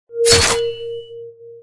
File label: attack ping